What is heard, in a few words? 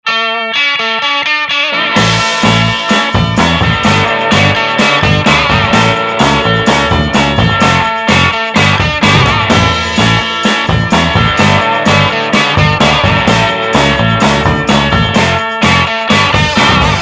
Rock Ringtone; Bouygues Telecom